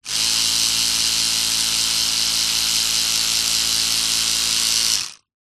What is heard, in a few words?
household electric toothbrush on run short off no brushing